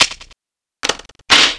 reload, weapons

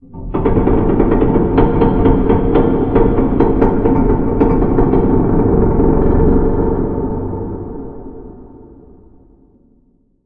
clang, ambience

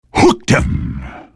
hook deploy

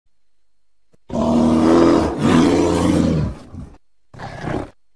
bear

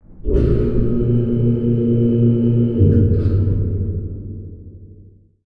muffled clang

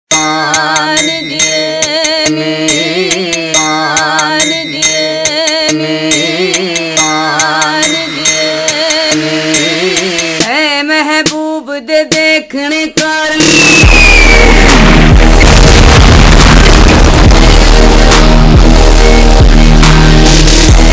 explosion

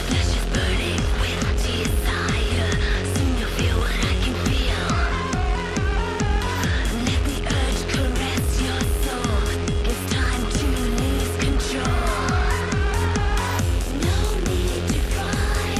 zombie rock

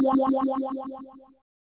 teleport

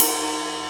drum

